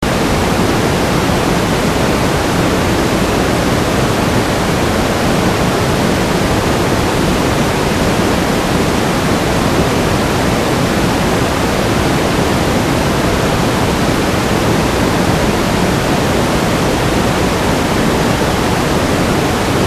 low pass noise; NOISECORN

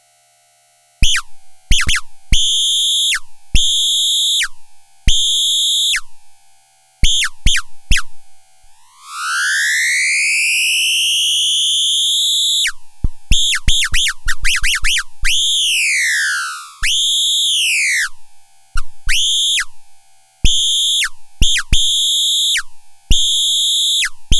coaches whistle; NOISE TOASTER

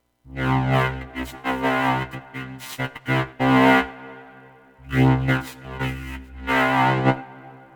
string robot, vocoder; analog synth

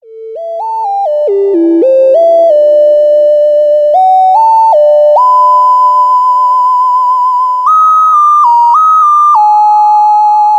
delay mod module, analogsynth

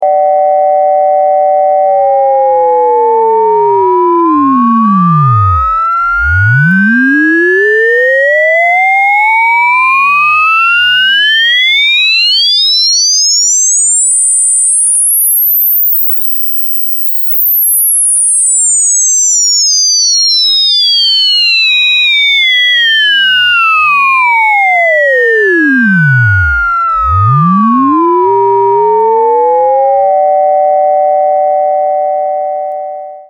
Internal Osc X Sine Sweep, ring modulator; analog synth

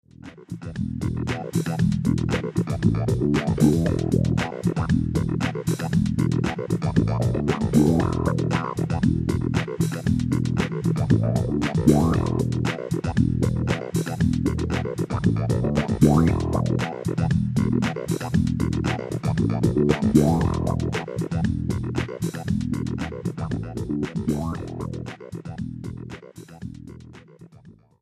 envelope follower funk, analogsynth

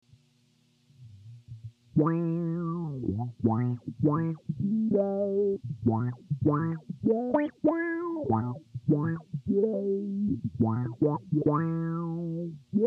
envelope follower guitar

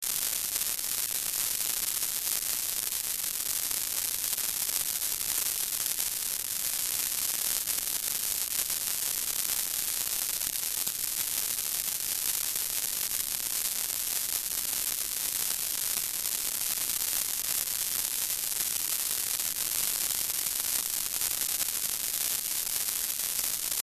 grainy noise hp low setting; NOISECORN

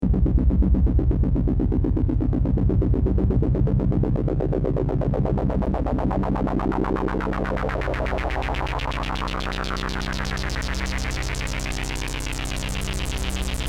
half res adsr lfo BP; state var vcf; analog synth

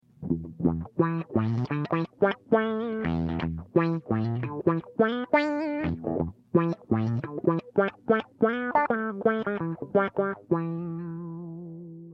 guitar lp and ef; analog synth; ultimate expander

